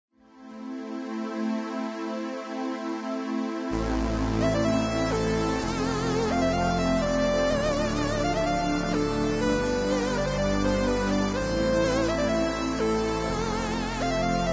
Keytar; Zomboid; Lifestyle

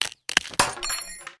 Breaking Weapon; Scrap Weapons; Zomboid

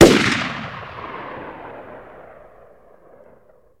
M16 Gunfire Single; Helicopter

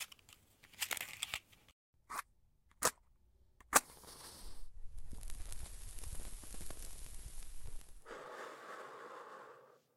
smoking matches; Zomboid; Smoking Sound Overhaul

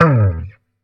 Guitar Electric Failstate; Instruments

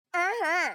Woman Dance Happy; voice; Zomboid; Lifestyle

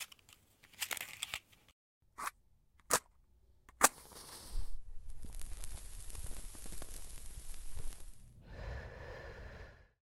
smoking matches; Zomboid; Smoking Sound Overhaul

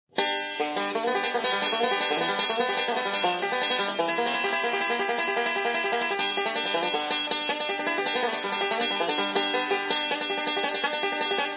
Banjo; Instruments; Zomboid; Lifestyle

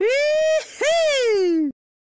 Man Woohoo; Zomboid; Lifestyle

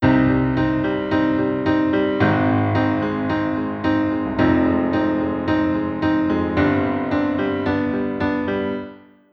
grandpiano; Zomboid; True Music; Tsarsound

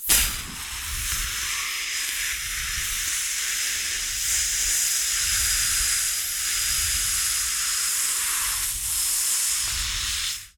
Weld Fix; Zomboid; The Workshop

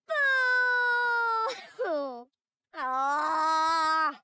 Woman Booing; Zomboid; Lifestyle

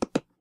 Open Kit, Belts; Zomboid; BetterBelts

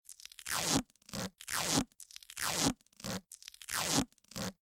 Spear Binding; Scrap Weapons; Zomboid

